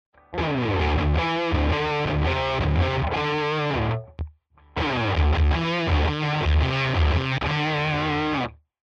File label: Asymm Octave max OFF ON; LifePedal